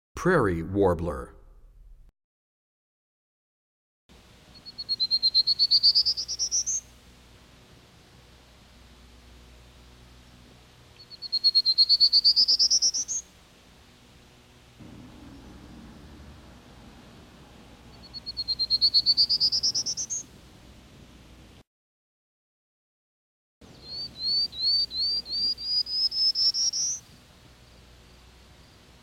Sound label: Prairie Warbler, Birds; 101BirdSongs